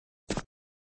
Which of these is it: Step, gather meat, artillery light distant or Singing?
Step